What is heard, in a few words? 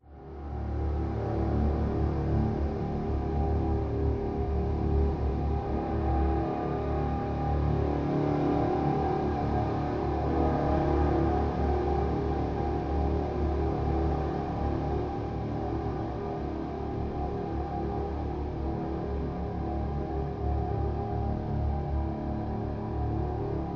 Suspence Pad, Low Intensity